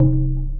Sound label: native perc, EMU Proteus; Proteus1